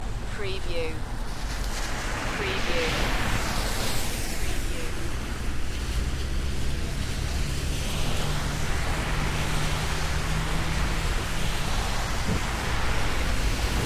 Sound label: Wet Traffic Intersection Short